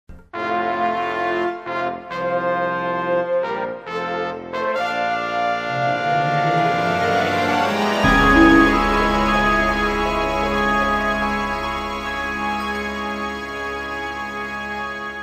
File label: elevator; Torin